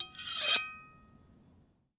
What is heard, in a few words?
Big Knife Scrape, kitchen knives; Micro Pack